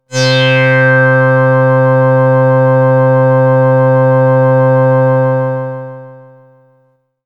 Sun-Sigh F, synthpad

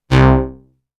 Multiwave Lead, synthlead

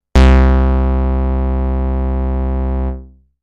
Basic Pulse, synthlead

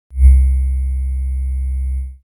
Soft, synthlead

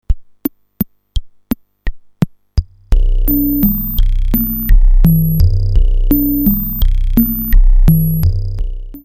Reso Ticker, texture

